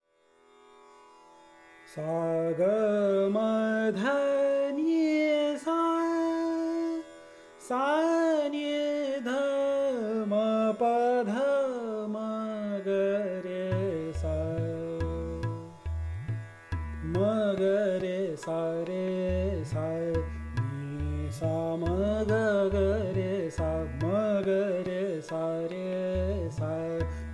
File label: Raga Bageshree Vocal; Bansuri Student Zone